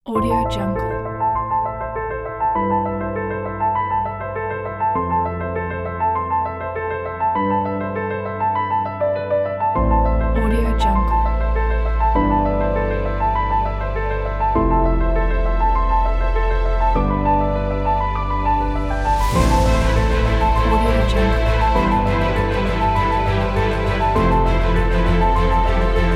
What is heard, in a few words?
motivation cinematic trailer